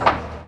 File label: bum; Mafie 1